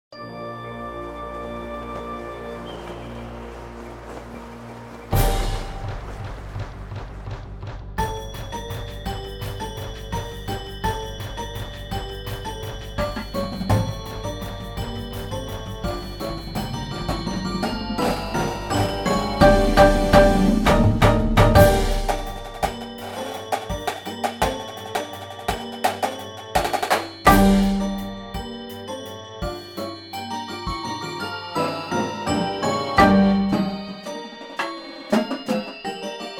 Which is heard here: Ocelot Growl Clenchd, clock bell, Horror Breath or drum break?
drum break